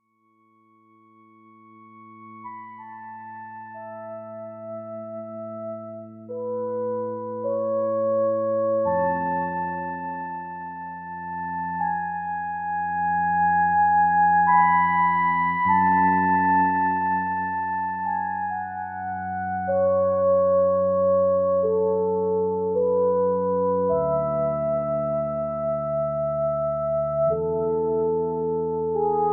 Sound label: Lullaby